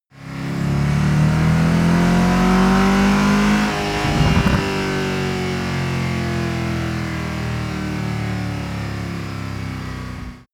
car mercedes sls amg short acceleration deceleration